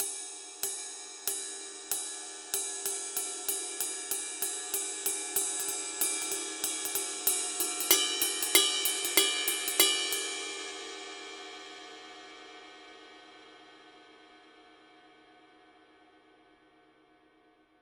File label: 20 ride pattern, paiste 2002